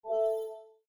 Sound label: Quirky Battery Low; windows 7